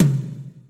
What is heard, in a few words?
high tom